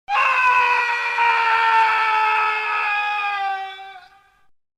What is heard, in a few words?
funfair scream